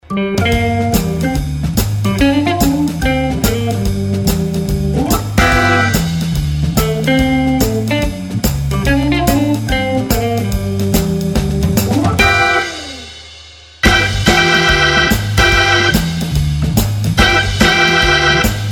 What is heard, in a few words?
DOG BARK BLUES